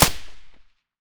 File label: m4a1 silencer mono distant, weapons